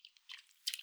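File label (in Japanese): くちゅ 短, 水音効果音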